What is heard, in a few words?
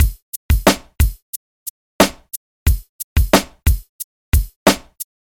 90 bpm drum loop sample f sharp key, kick tuned to the F# note. Loudest frequency: 2279